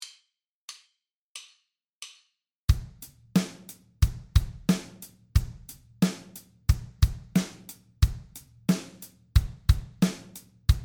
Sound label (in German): Rhythmus Tempo 90